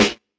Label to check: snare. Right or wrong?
Right